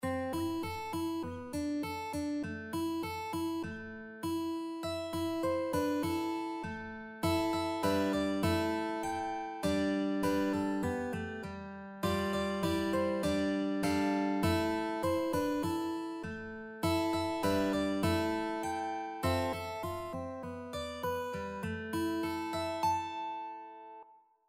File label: mandolin